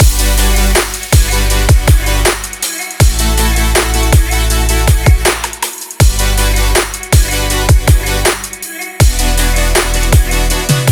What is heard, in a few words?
powerful future bass loop